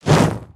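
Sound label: Weapon fire; shishkebab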